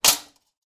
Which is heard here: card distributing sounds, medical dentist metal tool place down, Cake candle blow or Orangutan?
medical dentist metal tool place down